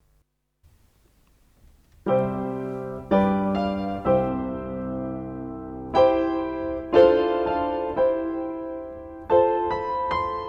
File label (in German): Klavierimprovisation